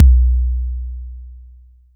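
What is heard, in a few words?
kick, Boss DR-202; Drums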